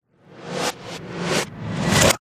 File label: Metal Rev Multiple; horror and atmosphere fx; Silent Hill Downpour